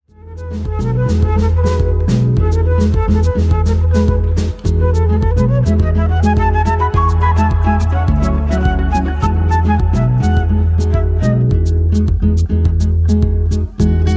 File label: Smooth samba